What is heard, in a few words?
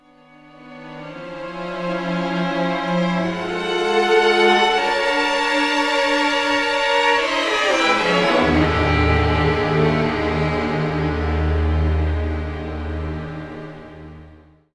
FULL ORC, Symphonic Adventures; East West